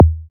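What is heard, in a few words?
Synth Bass Drum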